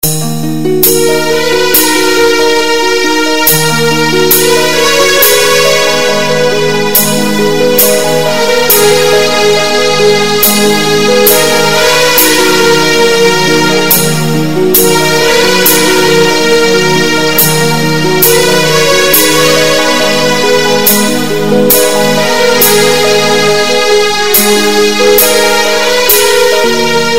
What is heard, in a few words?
Melody, RingTune